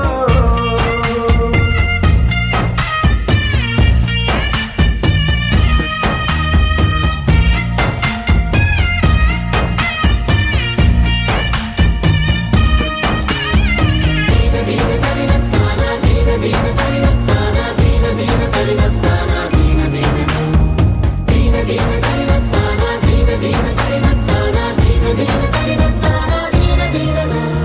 BG Tone; Legend Movie

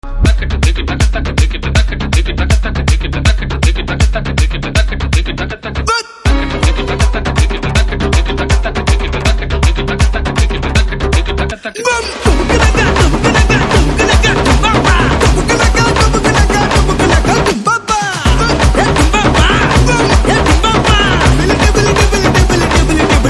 BG Tone; Gang Movie

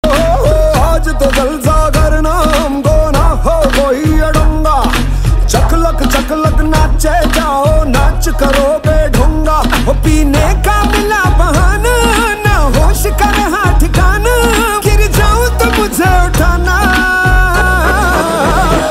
Ringtone; Gold 2018 Movie

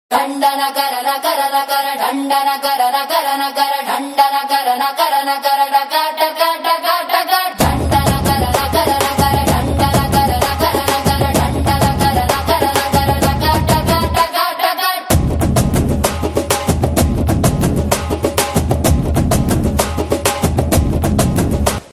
BGM Humming; Telugu Ringtones; 22 Sec; from Krack Movie

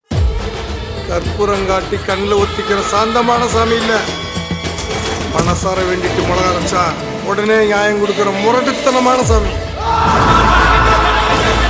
Tamil Teaser Ringtone; Karuppu Movie

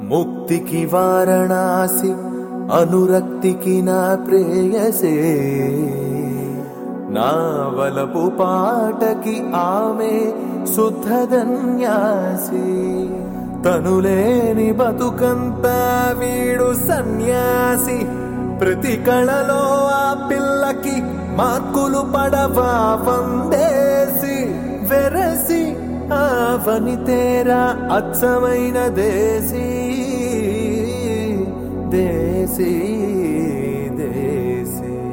Desi Startup Tone; from Srirastu Subhamastu Movie

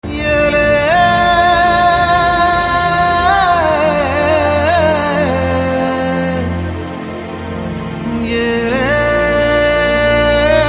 BGM Humming Ringtone; Tamil Ringtones

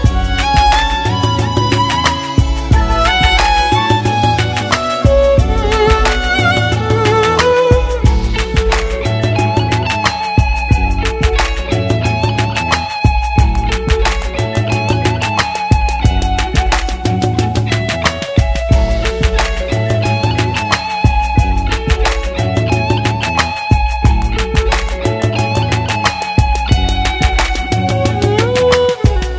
BG Tone; Kick Movie

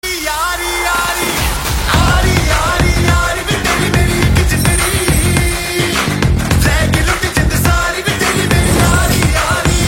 Song Intro Ringtone; Dhurandhar The Revenge Movie

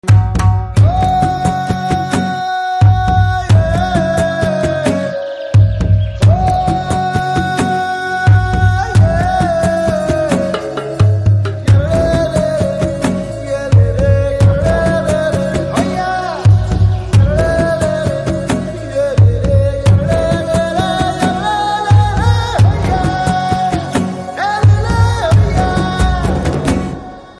BG Humming Tone; from Rangasthalam 1985 Movie

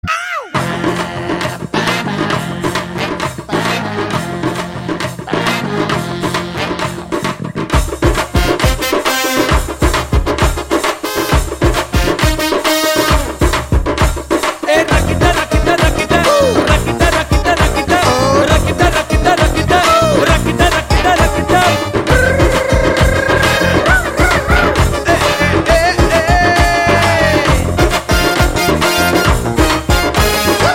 Telugu Theme BGM Ringtone

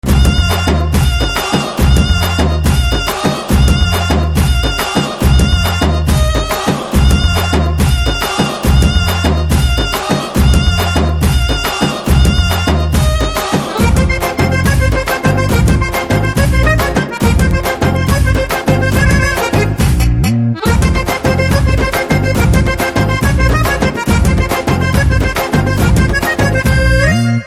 Drum Beats Ringtone; Telugu; from Allu Arjun Pushpa Movi

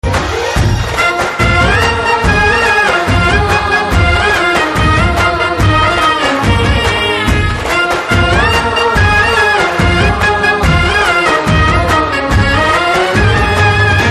BG Tone; Bharath Ane Nenu Movie

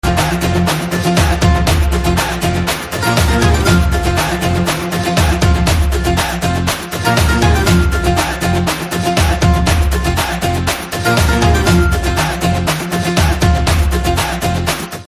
BG Tone; Pattas Movie; Tamil Ringtones

